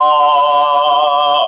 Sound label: "awe", Voice